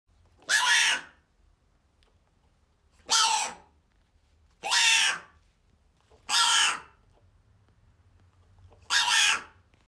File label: quokka; کوئوکا